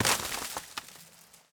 foliage hit; teardown; ps5 windows xbox series xs

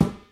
ball hit

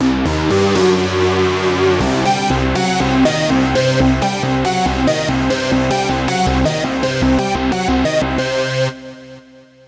Bleep Bloop short; Slinki

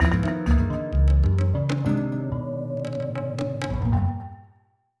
Casual Lose; the legend of gwen

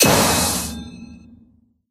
Cage bird Break; Teaparty; Angry Birds Seasons

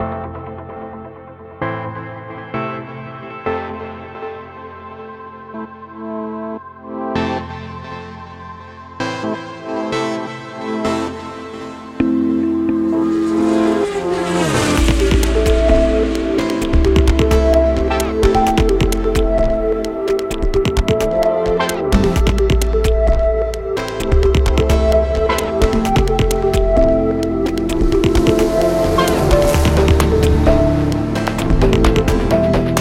menu music; ultimate racing 2d 2020

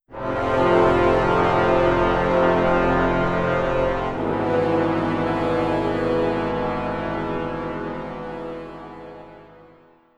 Player Death; ultimate adom caverns of chaos linux macos ps4 switch windows xbox one gamerip 2021

